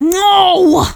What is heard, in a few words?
scout negative vocalization, fem scout